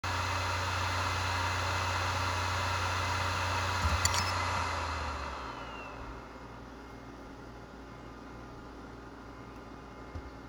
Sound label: Soft Shutdown, Seagate BARRACUDA ST4000DM004, HDD